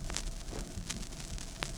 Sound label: Crackle, Vinyl Noise